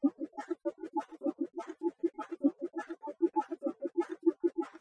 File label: Bongo